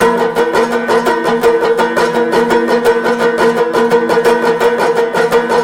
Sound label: banjo; Left 4 Dead 2; zombat; danger